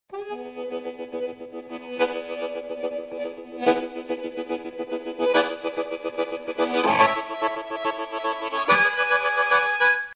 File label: Boogie Intro, harmonica